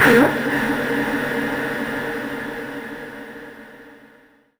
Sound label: Swallow Whole, FX Atmospheres; Zero-G; Soundclash Nu Dance Electro; Acidized Wavs